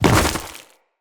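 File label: footstep on ice, enemies; Footsteps; Grineer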